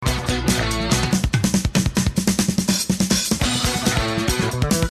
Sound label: Drums